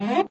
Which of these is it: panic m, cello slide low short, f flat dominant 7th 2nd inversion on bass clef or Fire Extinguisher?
cello slide low short